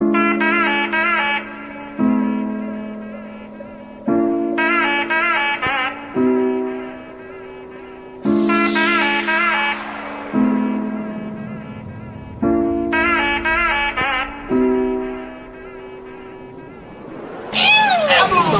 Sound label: Bass